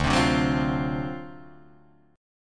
D 7th Sharp 9th D down Guitar Open D

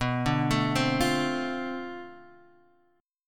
B Minor 7th Flat 9th Flat 5th B, guitar chord; Bm7-9-5 Chord {X 2 0 2 1 1} arpeggiated

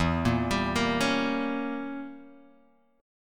E Diminished 7th E, Guitar Open F; Edim7 arpeggiated; JGuitar

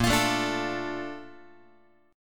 Bb Major 7th, Bb, down, Guitar; BbM7 strummed; JGuitar

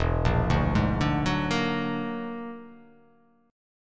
E 9th Flat 5th E down

